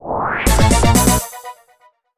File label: Battle Jingle